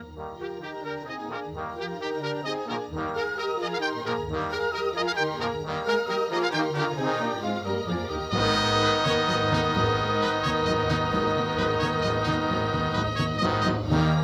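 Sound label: orchestra dry, ambisonics to binaural, order 1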